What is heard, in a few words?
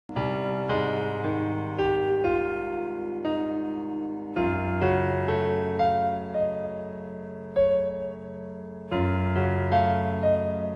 romantic concerto 2 piano